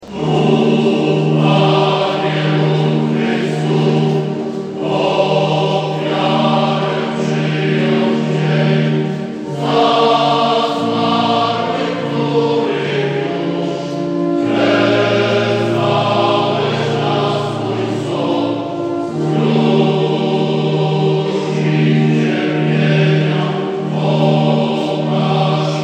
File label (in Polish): Chór męski; Pieśń za zmarłych; Siołkowianie